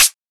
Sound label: 2000s Hot Pedal Hi-Hat One Shot D#; modern drum samples